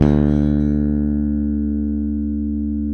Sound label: SPEC SUSTAIN, Bass